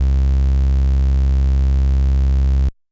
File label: Bass SAW L, Yamaha DTXpress-II